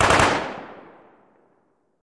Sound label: Machine Gun Single Rapid Fire Burst